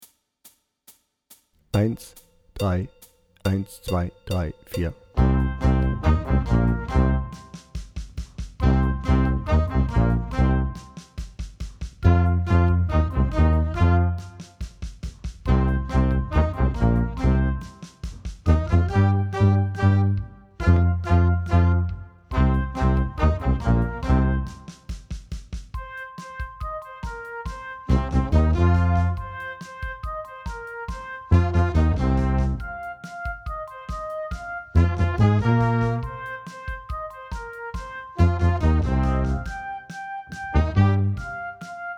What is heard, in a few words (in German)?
Hard Rock Blues Tuba lauter